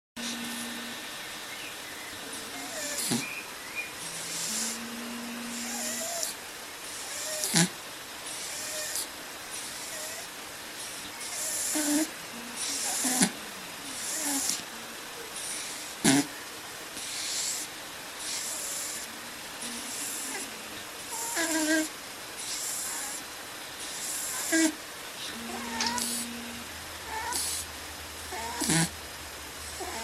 Snore

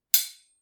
Sword Strike

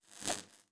Electric Wire

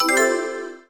Action

